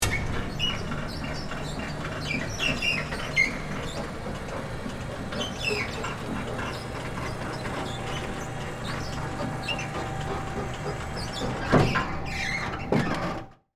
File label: Industry, Hi, Lo, Lift, Down; Machinery; On, Board, Perspective; BlastWave